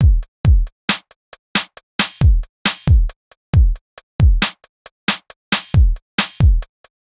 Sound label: Hip Hop Beat, Drums